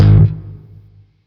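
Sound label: Sentient Shawzin Slap Octave One G Sharp; Chords; Warframe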